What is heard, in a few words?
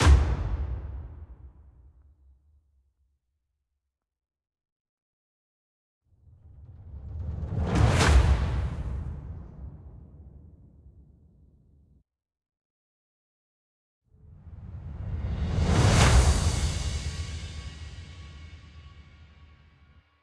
Real Perc Breakdown; Worms Rumble; PS4; Xbox Series X/S